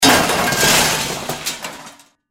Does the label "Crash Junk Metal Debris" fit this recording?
Yes